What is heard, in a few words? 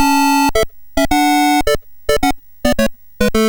sid, MOS6581R2; Berks Four